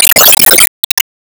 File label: Shock Wave; Disney Star Smash; Android, iOS, Mobile